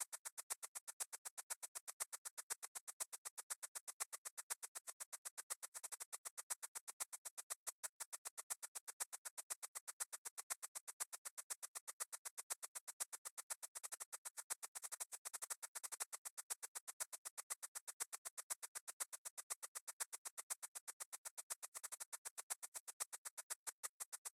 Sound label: SHAKER; rain world